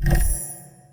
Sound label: panorama rollover; ui